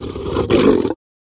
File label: Lion Inhale Wet Throa; big cats